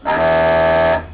Buzzer, Train; EN57-815; OpenBVE

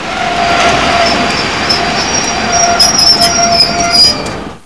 motor, Train; OpenBVE; 55022 Royal Scots Grey

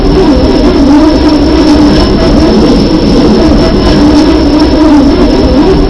flange, Train; OpenBVE

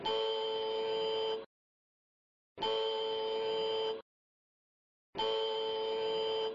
Depart, Train; OpenBVE

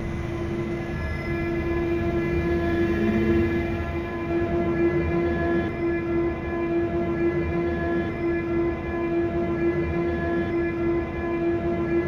Motor, Train; OpenBVE

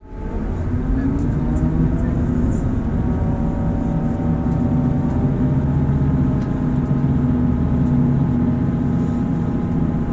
Revving up, Train cl158; OpenBVE

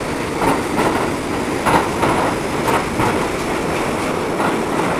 RUN, Train, R62 Kawasaki; OpenBVE

